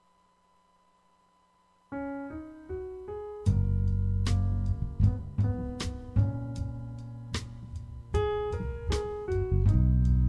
jazz

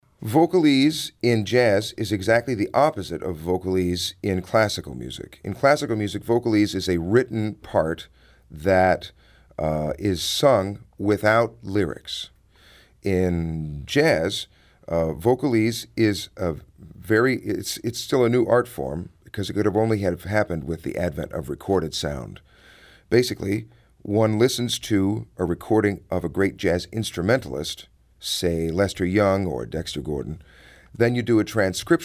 vocalese

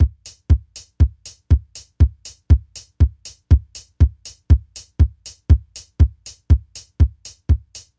kicks hats

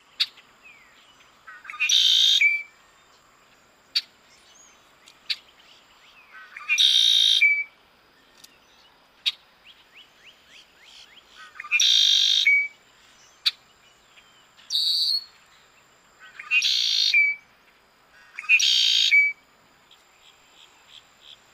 red-winged blackbird; paul marvin

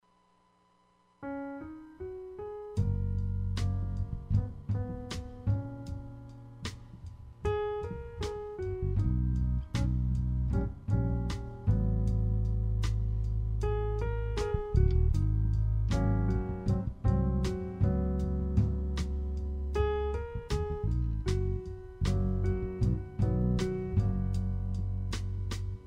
jazz

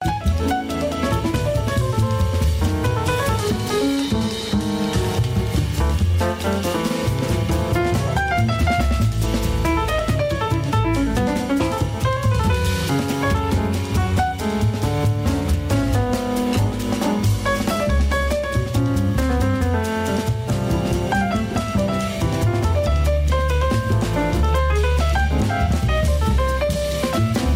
jazz

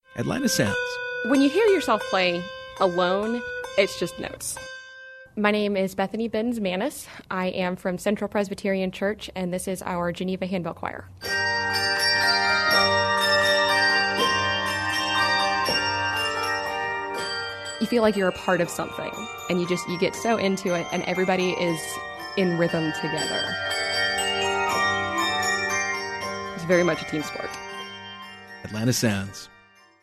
Handbell Choir

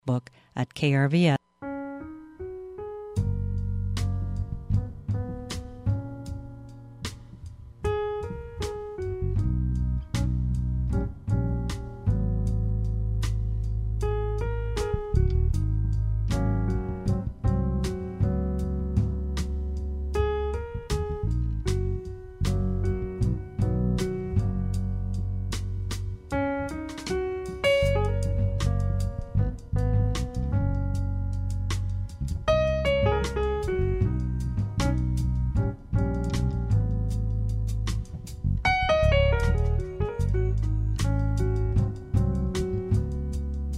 jazz

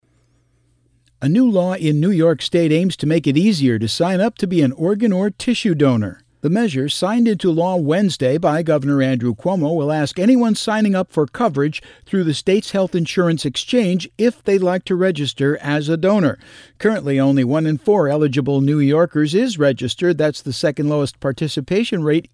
ORGAN

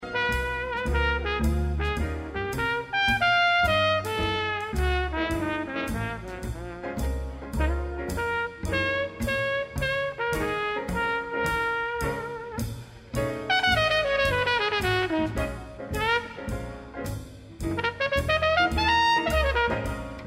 jazz

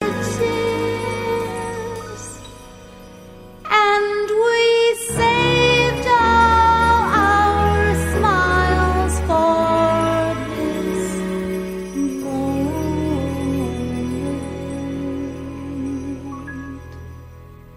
jazz

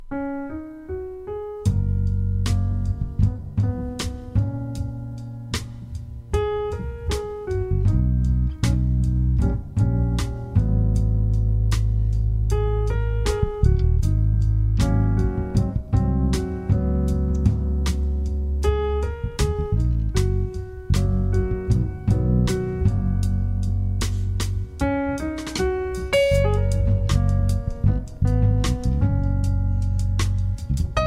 jazz

